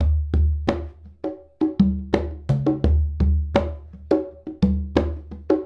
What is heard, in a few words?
Congas; Essential Percussion